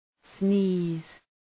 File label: sneeze